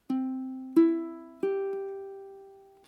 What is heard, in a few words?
chord ceg, ukulele